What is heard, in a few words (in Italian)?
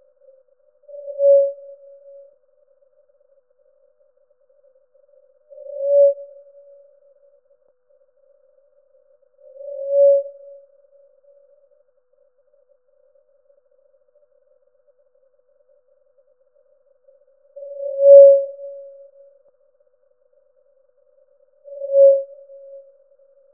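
balenottera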